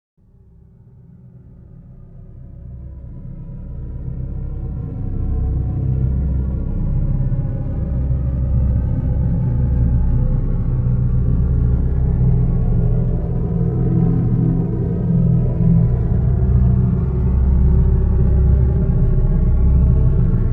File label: Subsonic Bass; Bizarre Planet Sampling CD